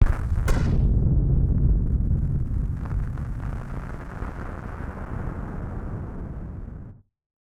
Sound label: Firework FX; musicradar; bomb factory